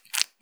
深めにストローク, ピストン運動 激しめ

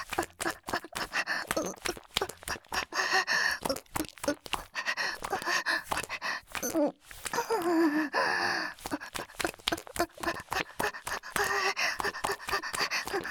ギシアンループ; 田舎のダウナー幼馴染の子作りを手伝って…; 第5、6話のギシアンループバージョン

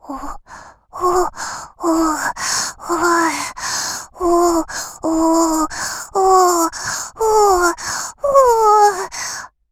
お?♥おっ♥おおっ♥おほぉ…♥

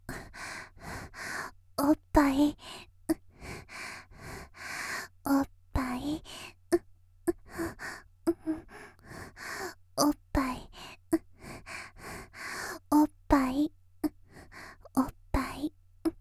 「パイズリ声」（おっぱい）と言いながら静かな喘ぎ; 「パイズリ声」（おっぱい）と言いながら静かな喘ぎ20秒; 綾波系少女

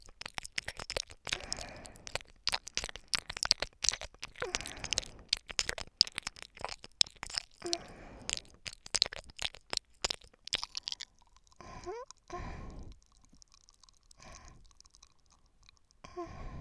左耳深め＋吐息

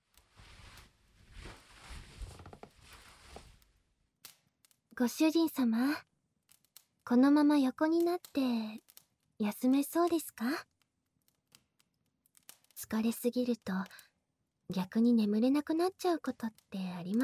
お出迎え お膝で耳かき 左耳, ASMR

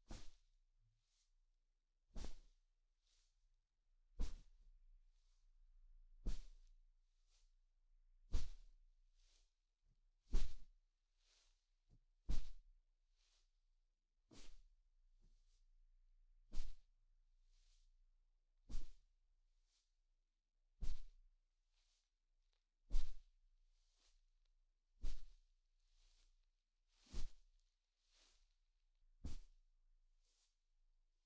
布団 を トントン 叩く; 3DIO; 布団の音

